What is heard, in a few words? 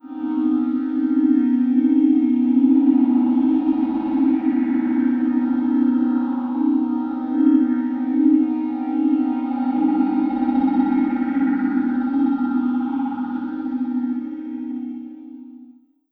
World of Dissonance, Analogue; Zero-G Ambiosis